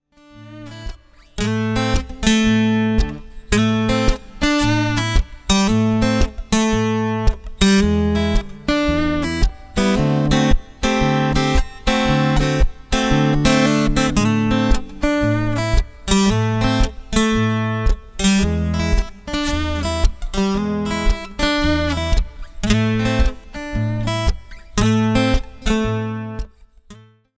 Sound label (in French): Petit blues